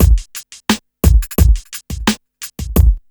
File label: RNB 87 BEAT, Hip Hop R n B; USB Soundscan vol.19; AKAI